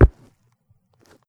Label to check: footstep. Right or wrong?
Right